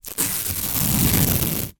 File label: industrial masking tape peel off surface